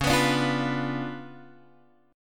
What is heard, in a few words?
C sharp 7th Flat 9th Guitar Open G, chord